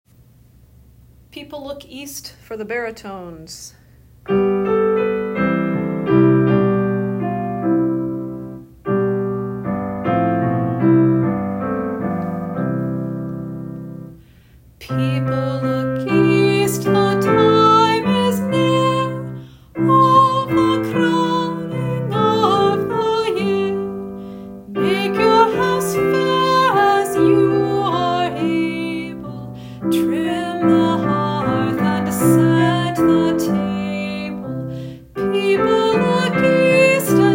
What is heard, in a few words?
People east baritone